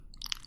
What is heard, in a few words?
Slimy, Slime; rimworld animations